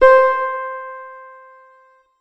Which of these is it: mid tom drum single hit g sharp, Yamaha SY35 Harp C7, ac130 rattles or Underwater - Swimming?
Yamaha SY35 Harp C7